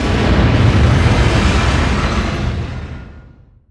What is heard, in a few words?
distance super heavy battleship attacked; Hearts of Iron IV Death or Dishonor